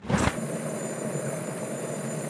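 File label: magma lurk flame loop; npc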